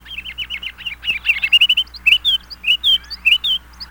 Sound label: kinglet; WAR